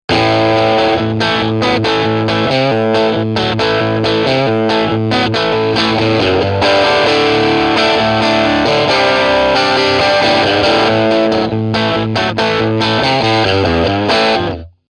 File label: Two Pro JR and Blender; fender